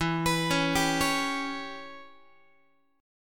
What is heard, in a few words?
E Minor 6th guitar chord; Em6 arpeggiated; JGuitar